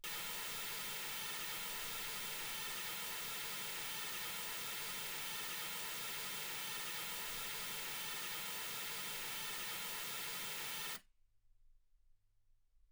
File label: mls16 48kHz